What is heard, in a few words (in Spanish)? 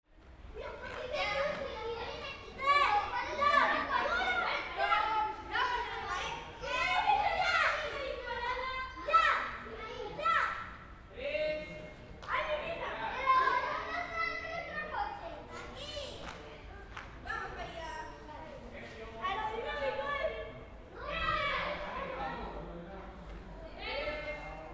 EXTERIOR LABORAL NINOS CARRERAS COCHES; SantiagoAbadia